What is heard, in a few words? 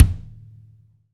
BD, Roland; Sound & Vision - Gigapack I